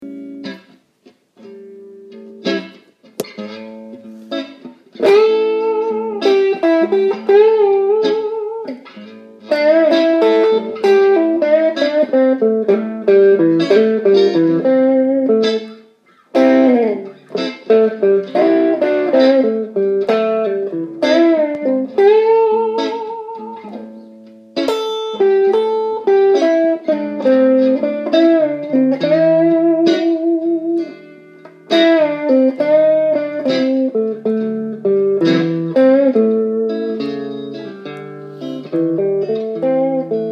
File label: major minor penta, blues guitar